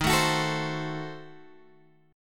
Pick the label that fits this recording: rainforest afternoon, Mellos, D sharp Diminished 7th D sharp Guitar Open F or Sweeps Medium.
D sharp Diminished 7th D sharp Guitar Open F